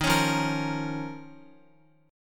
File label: D sharp 7th Suspended 2nd D sharp, Guitar; D#7sus2 strummed; JGuitar